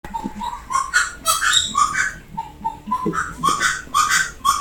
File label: russet naped wood rail, birds